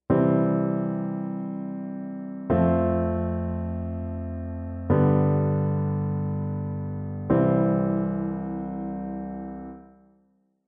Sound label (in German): Kadenzen Einfach; einfache Kadenz auch Vollkadenz